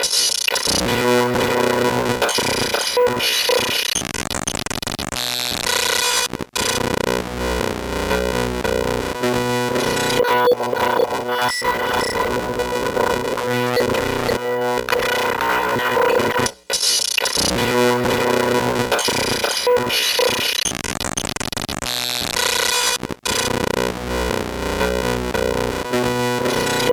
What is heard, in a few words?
psr 37 freez loop; bendexamples